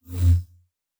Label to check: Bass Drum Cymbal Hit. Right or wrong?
Wrong